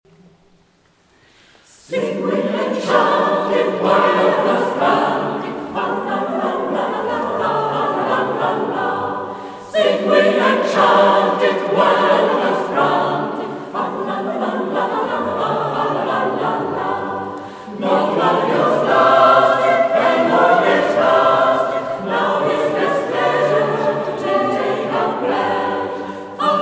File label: Sing we and chant, Ensemble vocal; Sing we and chant it; Ensemble vocal Accord